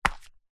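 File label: foley sandal flip flop single hard footstep on hard ground; zapsplat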